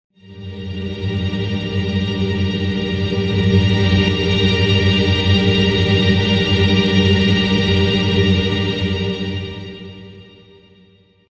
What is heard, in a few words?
Tremolo String Swell Ringtone